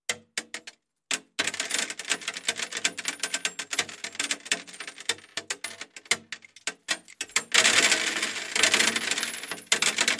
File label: Shell Case Mult Steel, shell casing movement